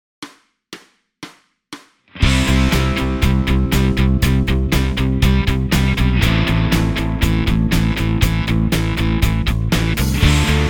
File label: karaoke